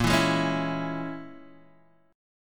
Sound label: A sharp Minor 7th Flat 5th A sharp; strummed; x,1,2,1,2,0